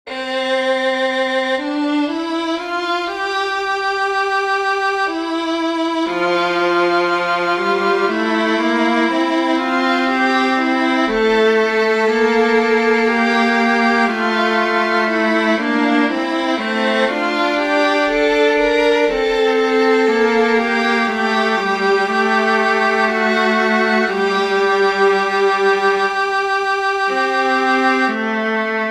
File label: for violin viola; lassus orlande; benedictus